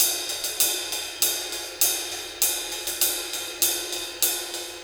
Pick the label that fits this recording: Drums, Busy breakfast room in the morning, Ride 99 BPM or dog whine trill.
Ride 99 BPM